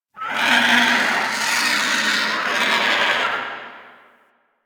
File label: Dungeon Island Vultures, Ambience